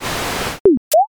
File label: gacha roll